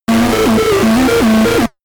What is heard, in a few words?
game arcade race tension